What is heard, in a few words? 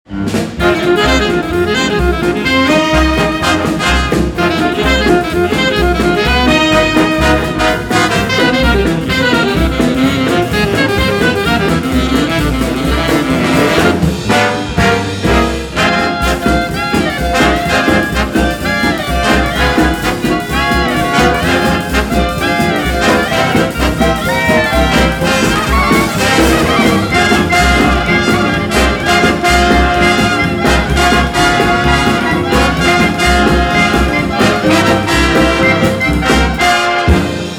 Bigband